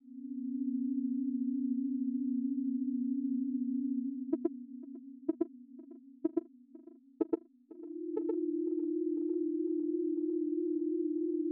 Creepy

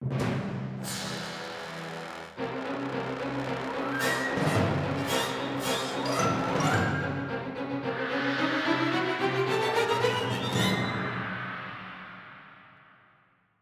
Battle Intro; Pikmin 3; Plasm Wraith Battle Intro (Mission Mode)

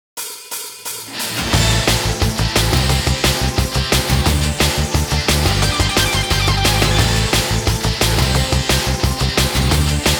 Fight; 426 Yon Nii Roku

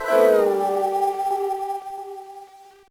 Failed, gba; Level 3

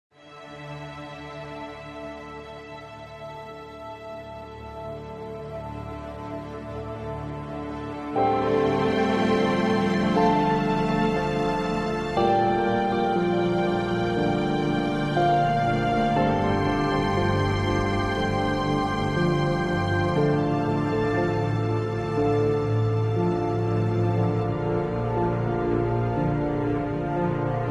Loop Sad; tales from the borderlands gamerip 2015

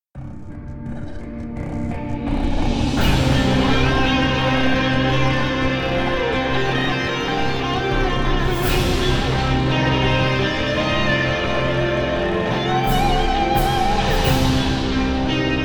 Music Dark Victory Full Guits No Horn Melody; starcraft 2 wings of liberty; MissionMusic

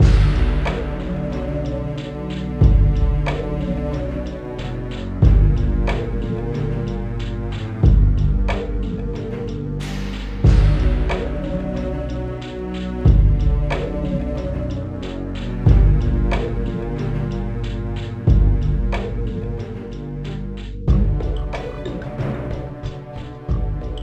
BGM Amb District

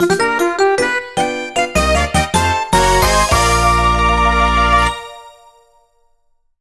Boss Battle Victory!; Mario Party Star Rush

